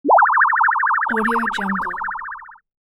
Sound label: cartoon ray gun blast